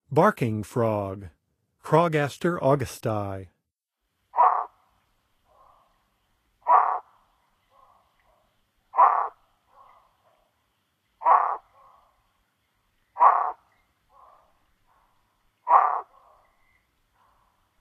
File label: Barking Frog; North America; Songs of Frogs & Toads of North America